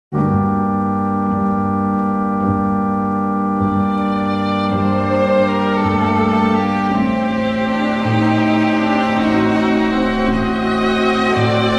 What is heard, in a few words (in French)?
Adagio pour cordes; Albinoni